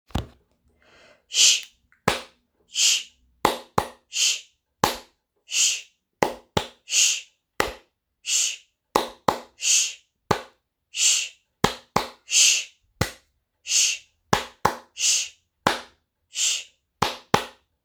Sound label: RHYTHM